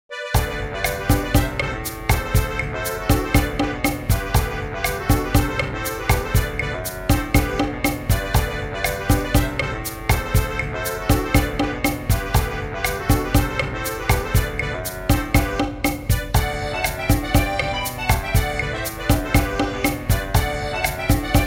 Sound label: Samba